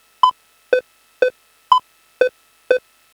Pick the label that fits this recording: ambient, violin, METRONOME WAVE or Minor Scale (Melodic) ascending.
METRONOME WAVE